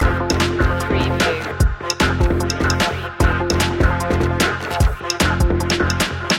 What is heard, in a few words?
big break loop